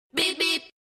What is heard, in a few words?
Beep Beep